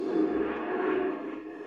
minigong

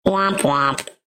womp womp; voicemod

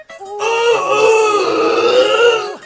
ouuuuuu

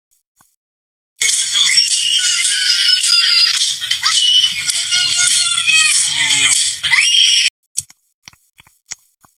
whooping

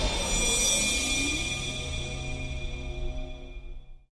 horror sting

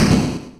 impact slam; undertale

